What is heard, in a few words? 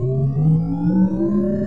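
shp transfer shield start, ship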